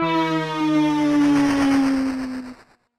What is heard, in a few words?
Menu Opening; 1942